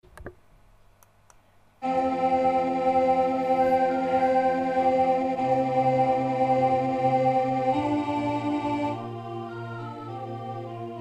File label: Caresse alt